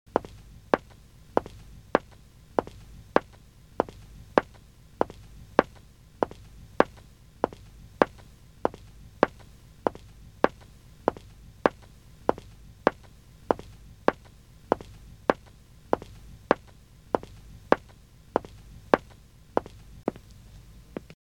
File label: footsteps woman wood